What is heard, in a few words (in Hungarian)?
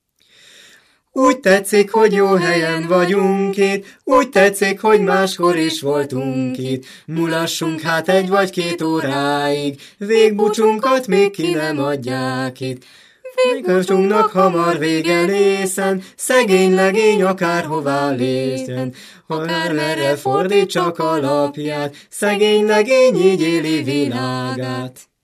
nepdal; ugy tetszik hogy jo helyen; nepdalok